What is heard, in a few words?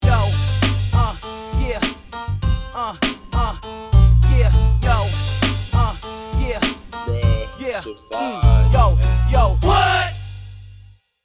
classic beat; social media